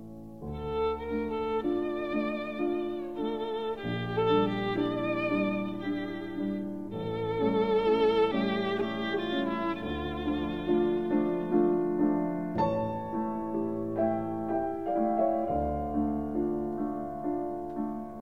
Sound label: Serenade Violin